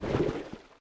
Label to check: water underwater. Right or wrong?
Right